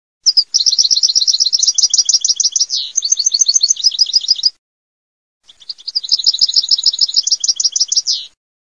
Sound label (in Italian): CARDELLINO, birdsound